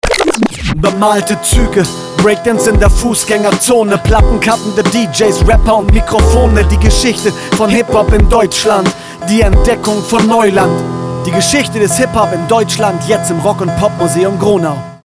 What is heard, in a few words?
Hip Hop